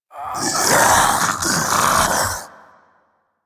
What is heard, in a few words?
Infested Splitter Joined Vocal Idle; Warframe; Grey Strain